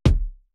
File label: kick, Roland MC-909